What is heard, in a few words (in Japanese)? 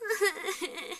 悲しみ; 感嘆